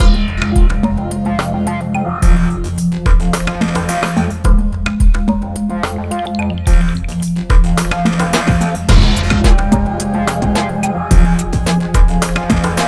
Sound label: music; alienarena; dm gauntlet